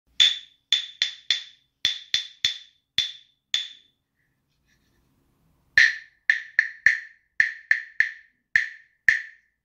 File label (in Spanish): Caja China Grande